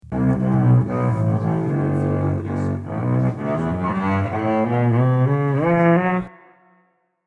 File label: Stico samples Arco